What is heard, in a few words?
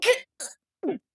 Death Grunt; Kinessa; Paladins